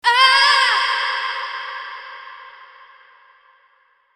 Aah, Cris et douleurs; bruitage

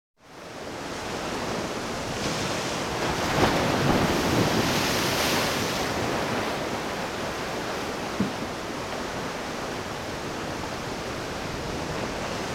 Vagues; bruitage; Liquides

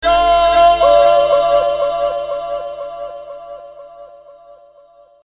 Tyrolienne, Paroles

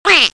Canard, Animaux; bruitage

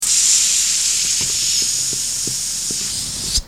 Ballon qui se degonfle

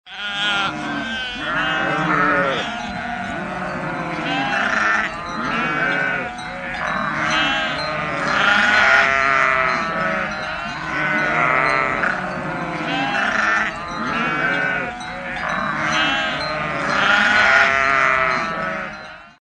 Moutons troupeau, Animaux; bruitage

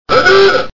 klaxon ancien; Dieppe

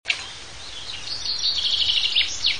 Ambiance jungle

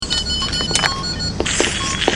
Ambiance hopital; bruitage

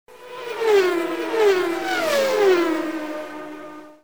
Formule 1

